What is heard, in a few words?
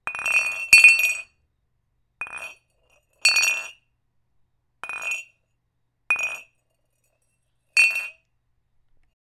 Glass Being Dropped